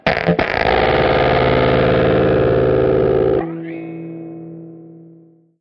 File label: just sick, guitar noizes